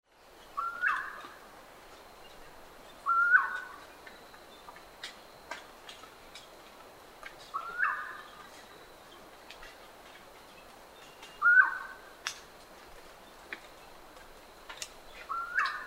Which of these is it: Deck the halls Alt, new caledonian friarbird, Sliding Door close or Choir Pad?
new caledonian friarbird